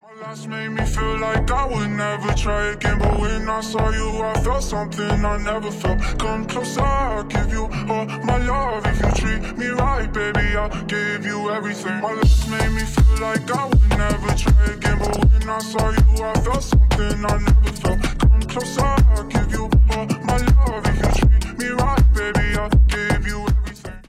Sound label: remix